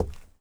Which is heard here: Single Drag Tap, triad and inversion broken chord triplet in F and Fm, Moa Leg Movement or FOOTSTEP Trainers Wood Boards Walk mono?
FOOTSTEP Trainers Wood Boards Walk mono